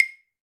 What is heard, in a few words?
Claves A; Latin Percussion; Drum Hits; Wood; Ableton; Factory Packs